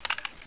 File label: anaconda load, firearms, weapons